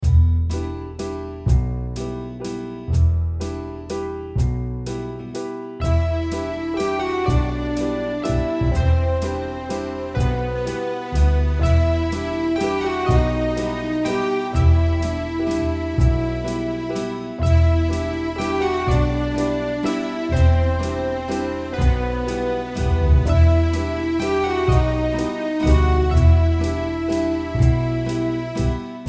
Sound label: MANDOLIN; Pate-Mandolin